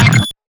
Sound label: GUI Scroll Sound; ROBLOX GAME ASSETS